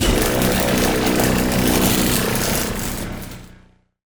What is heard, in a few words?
Spin Laserbeam; Artemis